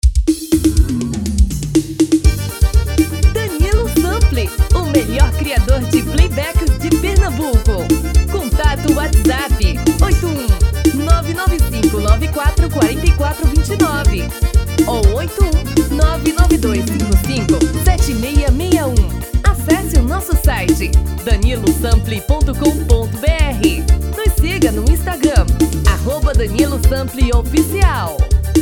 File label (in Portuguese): Forro das antigas